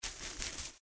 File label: Yellow Weeds; Blind Quest - The Frost Demon